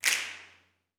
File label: SNAPS; Vocal Planet Vol1 Gospel; CLAPS+SNAP